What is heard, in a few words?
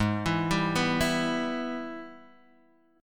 Ab Minor Major 7th Sharp 5th, down, Guitar, Half Step Down